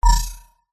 science fiction scan iris fingerprint reader beep buzz